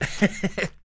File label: LAUGH, Jazz FX; LAUGHS; Spectrasonics Vocal Planet